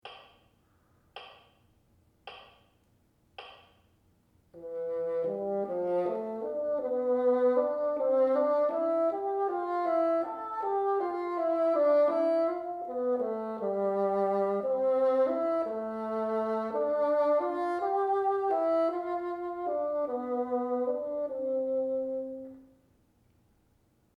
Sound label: teacher, bassoon; The University of Texas at Austin - Sarah & Ernest Butler School of Music